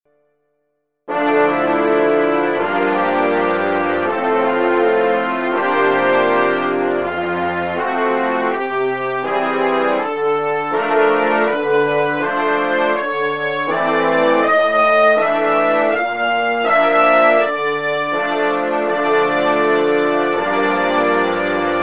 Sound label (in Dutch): Chromaatje Brass Stijl